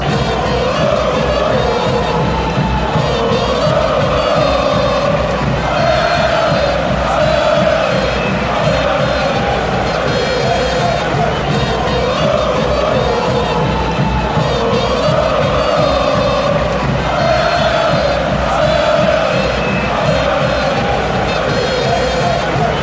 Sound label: allez allez allez allez allez, chants